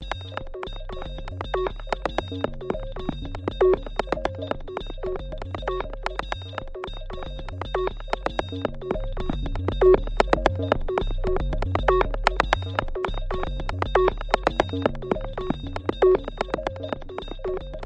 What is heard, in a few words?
116 beeper journey, SYNTH AND ELECTRONIC LOOPS